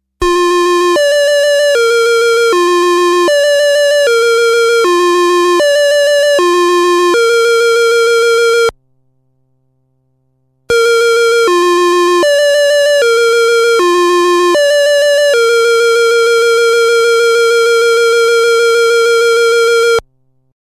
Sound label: Musical Horn